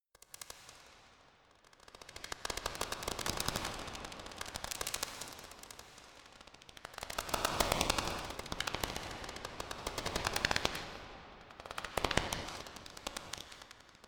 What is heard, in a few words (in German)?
Bats n Insects Stereofassung